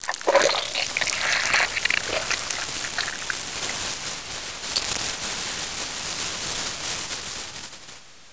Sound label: head gore